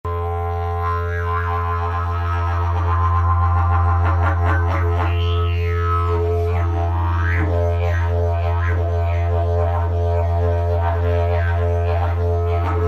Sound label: didgeridoo; jilm bleskoles; 32 mm; Ladění : E - F; Tomáš Dufek